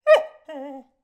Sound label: animal dog labrador yelp whine internal